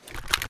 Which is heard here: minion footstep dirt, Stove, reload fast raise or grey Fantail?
reload fast raise